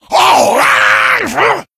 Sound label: rage at victim, infected, male